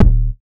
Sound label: Star Instruments Synare PS-1